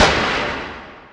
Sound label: asteroid damage metallic big; Factorio